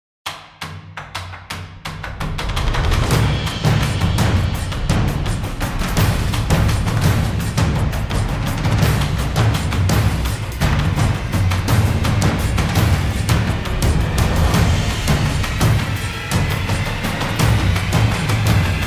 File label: Urban Parkour, Relentless Percussion; Gothic Storm Music